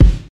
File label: fat boom bap hip hop kick single shot g key, Royality free bass drum single hi